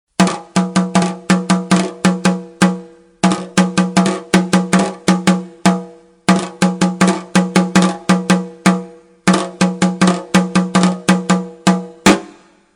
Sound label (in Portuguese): Tambor